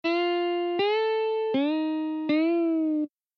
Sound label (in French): bend, guitare